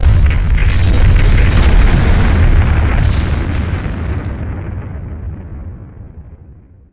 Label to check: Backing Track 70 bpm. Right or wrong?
Wrong